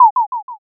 m message

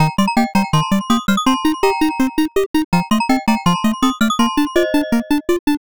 bgm

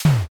stamp

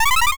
synth voice

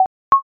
drag

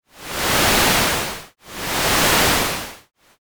shoreline

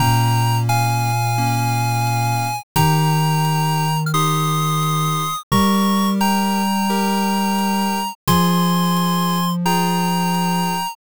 bgm mellow

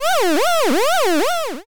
confuse

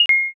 pos

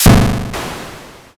thunder magic

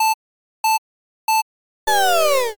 cd descend

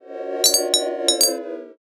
tavern

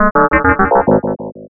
alien signal

